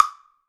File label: block